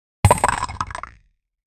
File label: drop it, item